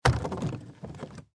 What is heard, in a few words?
Foley, Suitcase, Set, Down, Empty, Hard, Shell; BlastWave